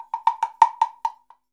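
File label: ambient cowbell, percussion; Univers Sons; Basicussions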